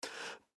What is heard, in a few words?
Human Inhale, Inhale Fast Element Male 25 Yrs; Breathing; Blastwave; Humans